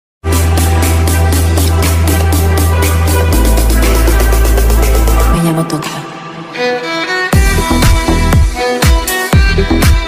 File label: BGM Instrumental Ringtone; Money Heist Professor